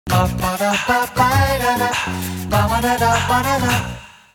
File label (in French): Emission link, jingle